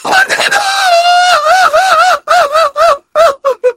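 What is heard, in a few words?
engineer pain critical death; voice packs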